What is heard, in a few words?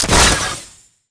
Mvmt Servo Jump; AI Stalker; Titanfall 2